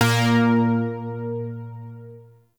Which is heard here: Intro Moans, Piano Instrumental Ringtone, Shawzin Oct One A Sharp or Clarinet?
Shawzin Oct One A Sharp